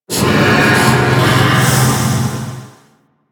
Multi Steam Jet Machine, Ambience; Warframe; Corpus Railjack

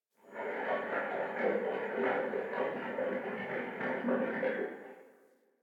Digest Interior Small, Ambience

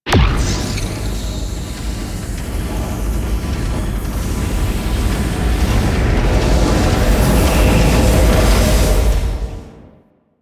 Ship Charge Rumble; Vala; Warframe; Quests; Wraith Quest

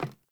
Sandals Run Wood, Footsteps; Characters; Player

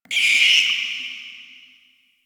interior screechy call; Ambience; Misc Indoor; DE; Warframe; Infested Eris

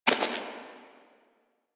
Screen Glitch; Player; Warframe; Mini Games; Caliber Chicks Minigame

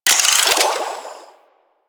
Water Sprayer Alt Pump Load; Warframe

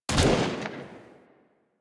Fire Shot, Weapons; Warframe; Braton

